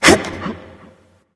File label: grunt jump; smite; jingwei